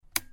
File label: light, vehicle